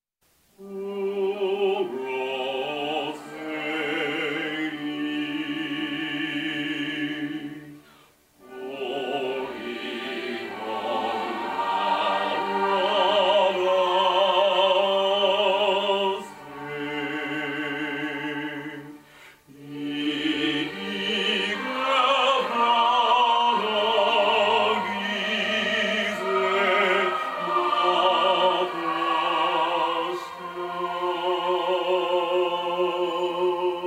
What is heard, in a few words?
bass